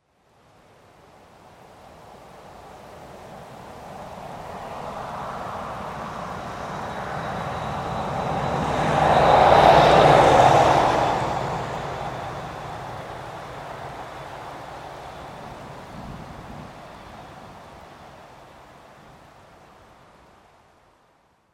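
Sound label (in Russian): мокрая дорога мелкий дождь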